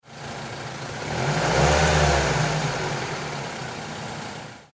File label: Car Mazda 3 Low Rev; Power Car sounds